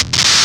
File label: BACKFIRE, PHUZZ DRUMS; Spectrasonic Distorted Reality 1